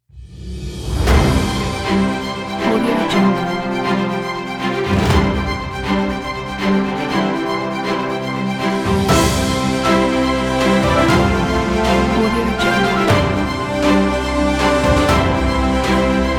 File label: victory award fanfare ident pack